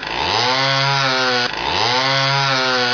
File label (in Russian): ceplnaya pila zvonkii blizkii progazovki